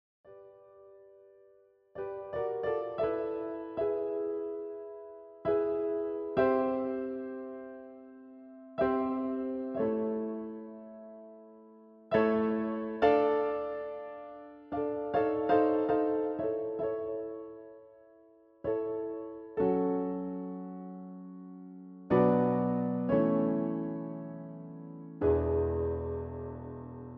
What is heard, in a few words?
Christmas Carols piano roll